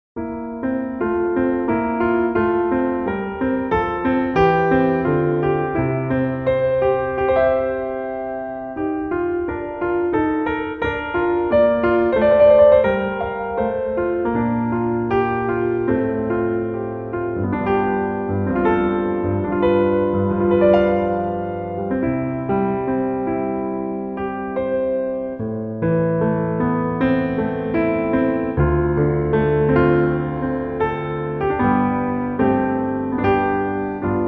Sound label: piano